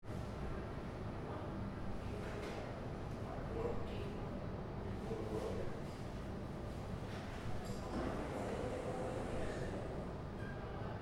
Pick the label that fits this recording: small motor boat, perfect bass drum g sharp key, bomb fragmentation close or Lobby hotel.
Lobby hotel